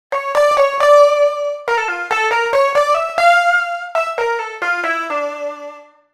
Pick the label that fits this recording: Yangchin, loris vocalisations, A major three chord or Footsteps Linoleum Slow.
Yangchin